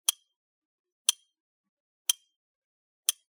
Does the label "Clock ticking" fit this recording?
Yes